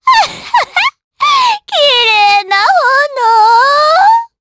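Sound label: Vox Dead Japanese; Pansirone; kings raid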